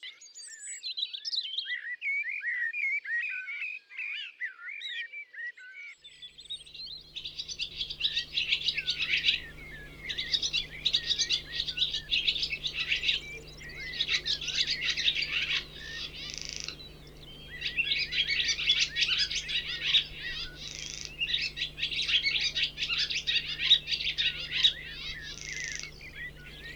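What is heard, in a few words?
barn swallow; derevenskaya lastochka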